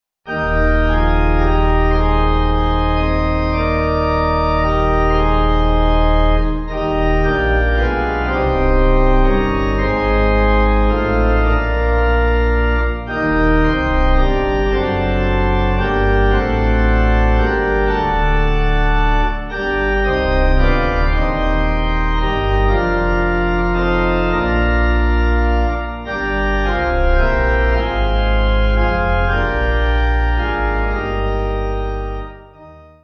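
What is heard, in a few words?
Pipe organ, church music backing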